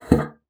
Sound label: BASSOON; Xsample VOL-6